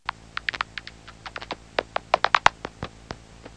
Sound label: bat